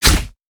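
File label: armadillo basic attack; rammus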